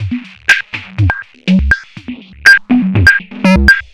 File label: Drums Strange